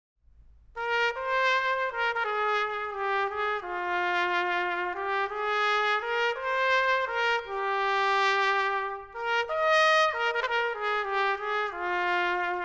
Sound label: Solo Trumpet (Normal Polarity)